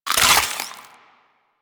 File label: Infested Beam Pistol Reload A; Warframe; Weapons